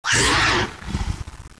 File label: alert, hunter